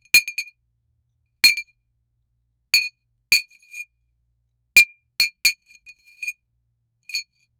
pirate ship glass bottle

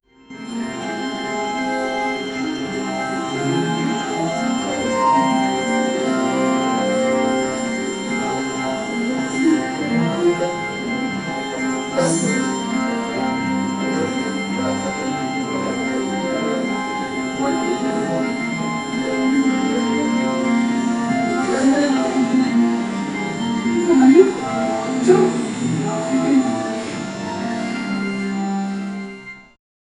advertising music for sel, business

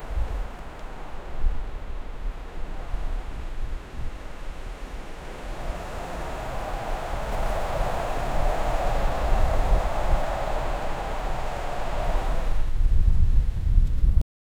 moderate wind gusts at sn

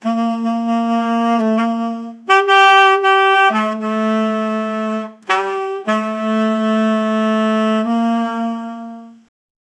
saxophone melody

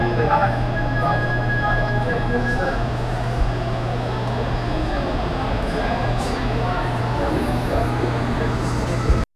metro moving pov people

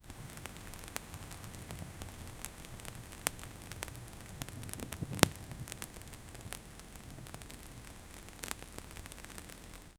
the sound of a fire

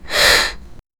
a girl making a powerful, breath

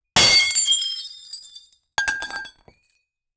huge amount of glass brea, break

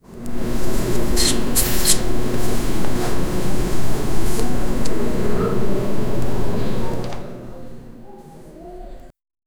a soundscape depicting, hospital

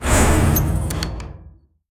the clear sound of a, futuristic

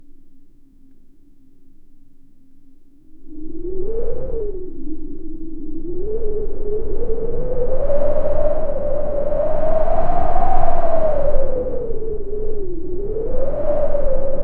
wind is being picked up, consistent

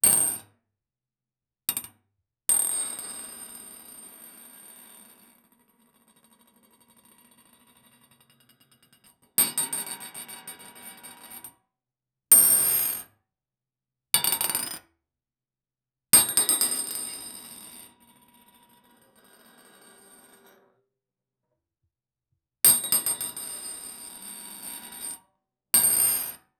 petanque ball metal

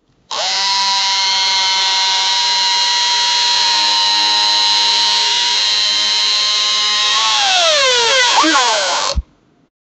electric scooter

